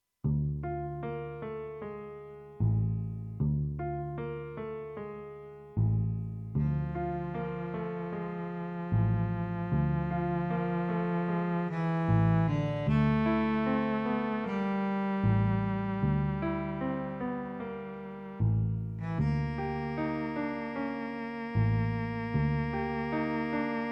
rondo milonga, flute, clarinet, piano, string quintet